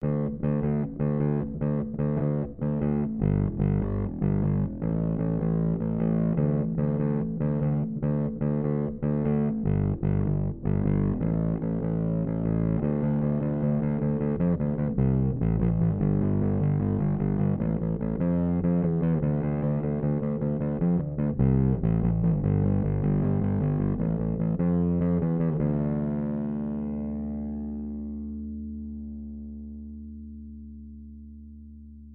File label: life bass sans trackplug